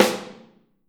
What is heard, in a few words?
Snare OH; Snare OH All; Drums Percussion